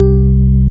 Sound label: Organ Bass; AMG - Prototype Drum & Bass